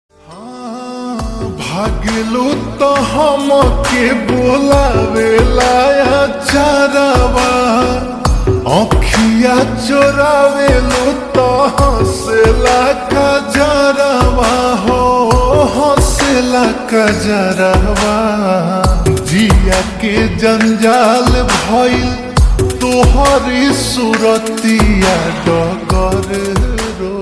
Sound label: Slowed Reverb Ringtone